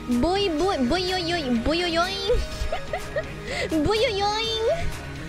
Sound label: boiyoiyong